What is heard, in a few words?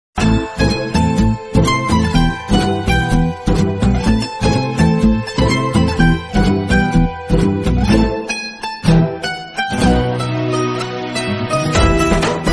bgm background music